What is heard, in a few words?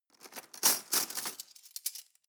cutlery teaspoons drawer grab soft; objekte; besteck